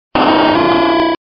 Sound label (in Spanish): Grito